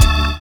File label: FILL; Univers Sons - Kitch 70s